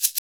SHAKER, Modern Percussion Loops

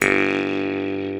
MT G, JHARP G B; Xsample VOL-9

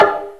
LOW BLK, TECHNO INDUS; Greytsounds VOL-1

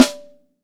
MAPLE SNARE

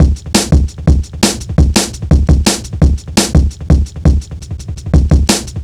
170, drum loops, drum & bass; 90 s Sample CDs; Total Drum&Bass

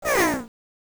Voice "hmm"; Ristar; M8; Genesis

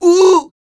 MALE HUMAN, Vocal Planet R&B & Dance; ILIO

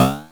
Drums, Hits; DCM8

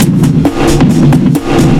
FXBEAT

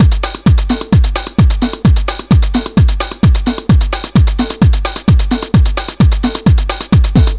DRM, LOOPS 130, House

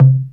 PERC FX, SYNTH PER

